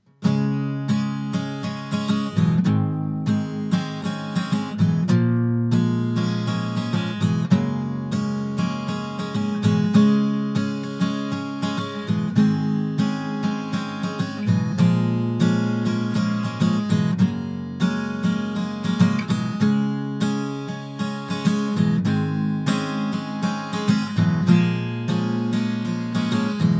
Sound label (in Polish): przepis na rzewna ballade strój cis